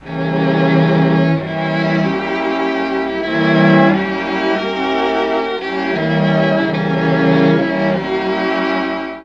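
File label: F4 bass strings, Mellotron; BASSA+STRNGS; Mike Pinder - Mellotron Archive CD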